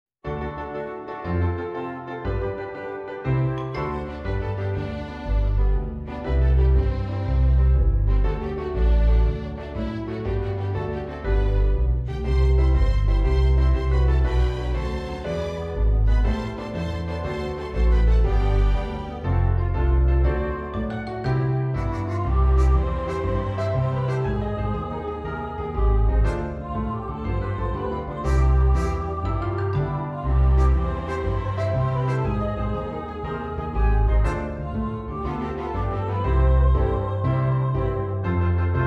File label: Christmas Is Here PIANO